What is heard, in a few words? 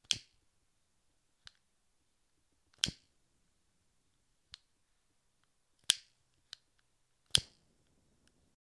Lighter